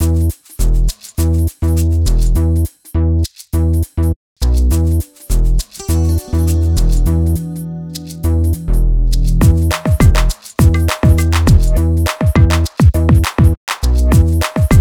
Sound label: 102 Emin, Hip Hop Exotica